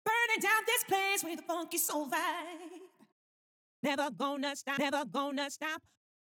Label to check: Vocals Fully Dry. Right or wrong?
Right